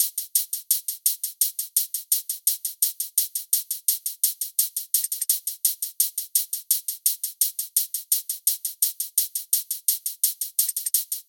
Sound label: Shakers